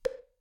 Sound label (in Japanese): ポク 残響